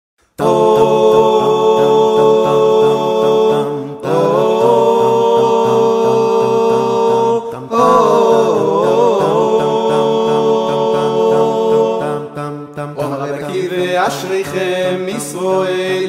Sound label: vocal